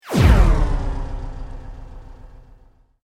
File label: Sweep Mechanical Medium